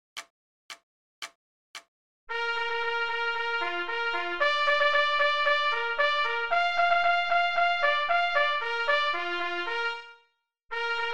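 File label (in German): Signalhorn, 114 bpm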